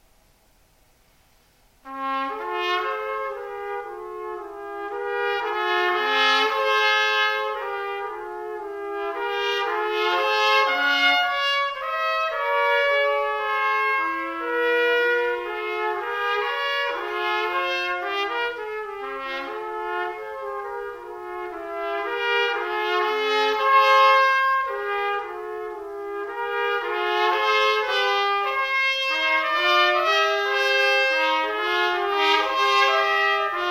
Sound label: trumpet duet; rubank